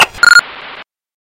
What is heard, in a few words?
Walkie Talkie